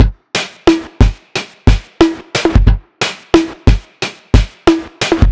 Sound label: Snare